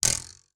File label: metal spring; wood metal SFX